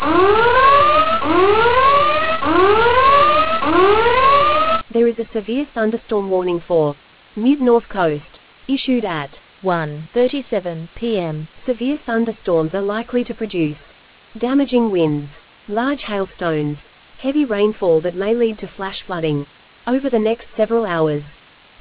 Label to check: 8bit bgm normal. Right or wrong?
Wrong